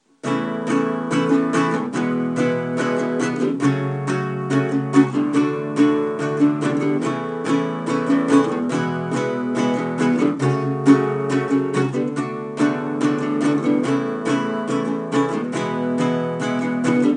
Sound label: Progression in A; Same progression, different rhythm